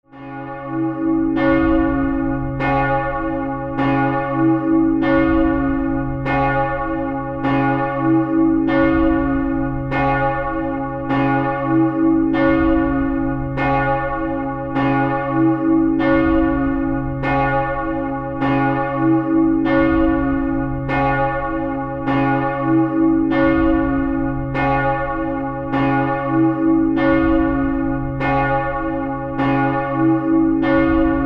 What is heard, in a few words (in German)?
glocke